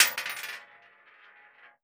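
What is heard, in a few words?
bullet case bounce 308 metal; gmod; viper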